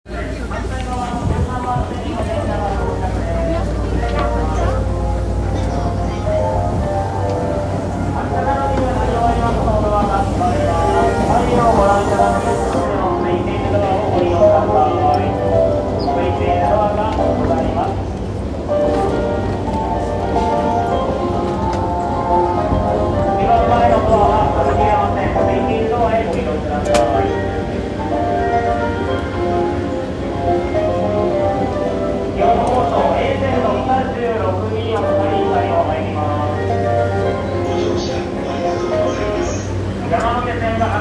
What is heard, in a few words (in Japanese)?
JR 山手線 新宿 CCW, train sounds